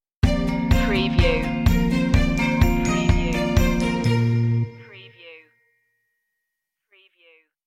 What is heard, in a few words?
working stinger